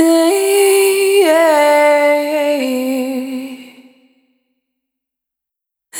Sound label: Single Voice Melodic Loops